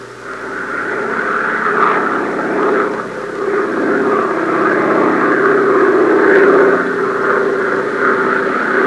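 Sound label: fighter plane; Sound Library 2000; Wayzata Technology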